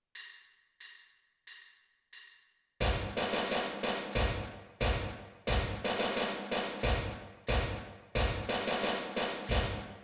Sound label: the conquering hero snare drum bass drum part a